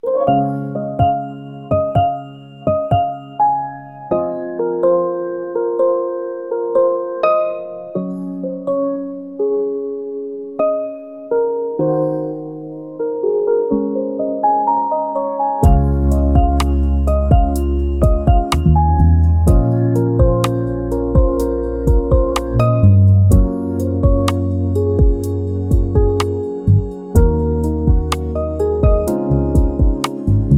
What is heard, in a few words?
piano calm